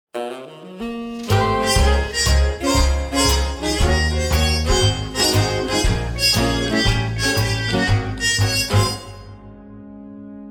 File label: Swing to Nostalgic mood to Swing